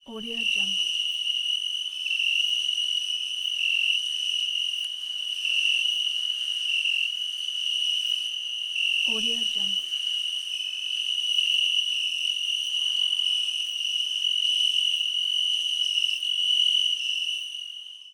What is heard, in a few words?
crickets